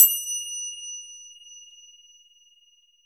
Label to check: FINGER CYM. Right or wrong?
Right